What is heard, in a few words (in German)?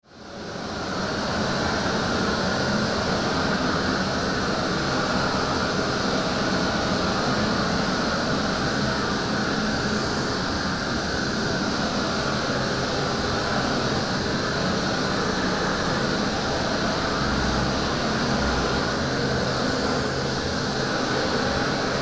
bitumenbahn